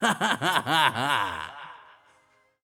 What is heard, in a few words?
Bad Laugh Man